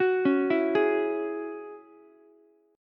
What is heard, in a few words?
Ab 7th Suspended 4th Sharp 5th Ab down Ukulele; Ab7sus4#5 arpeggiated